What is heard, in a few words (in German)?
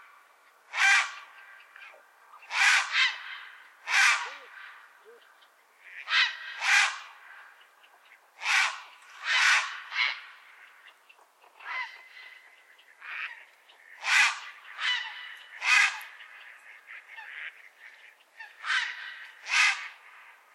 graureiher